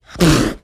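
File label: Ocelot Hiss Curt Sneeze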